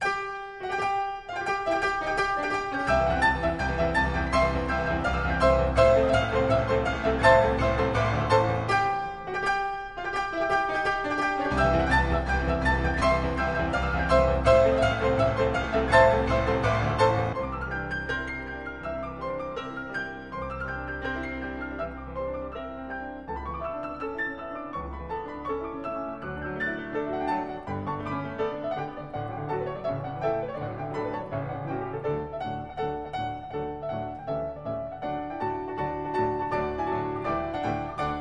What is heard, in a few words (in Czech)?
Slovanský tanec č.15 C dur Allegro Vivace, varianta pro čtyřruční klavír; (Kolo) op. 72; 1886; Antonín Dvořák